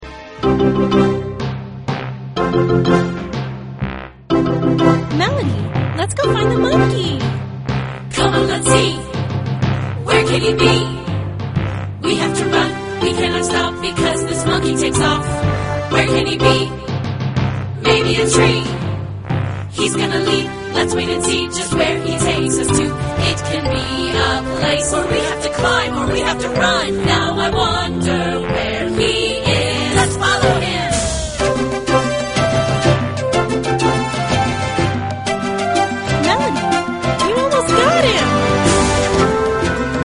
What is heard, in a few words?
melody